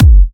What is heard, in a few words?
thick steel kick drum sound g key